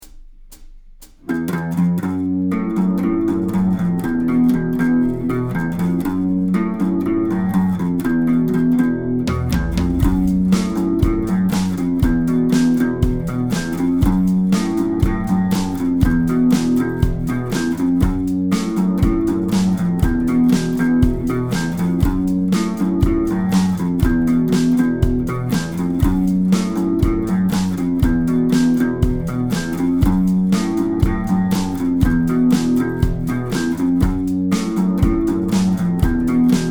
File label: riff, guitar